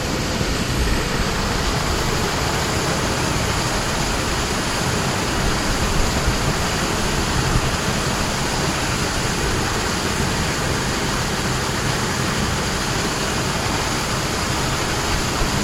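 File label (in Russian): сток на маленьком озере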